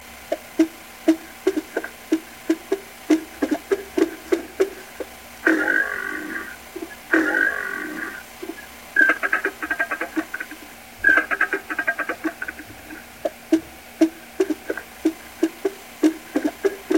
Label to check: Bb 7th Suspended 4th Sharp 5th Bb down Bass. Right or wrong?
Wrong